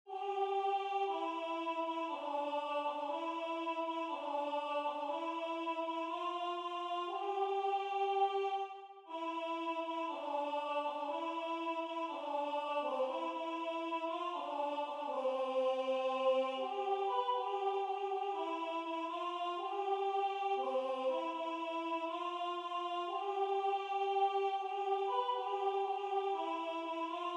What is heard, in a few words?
Soprano